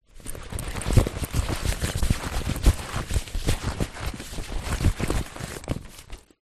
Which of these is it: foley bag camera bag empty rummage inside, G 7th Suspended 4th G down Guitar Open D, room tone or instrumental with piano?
foley bag camera bag empty rummage inside